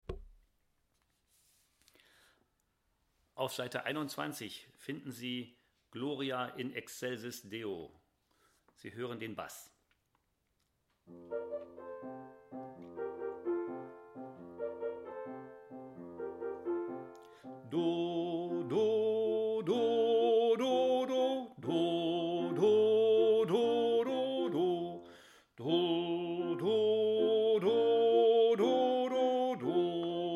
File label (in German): Bass; Chorfest der EKM auf der BUGA; Gloria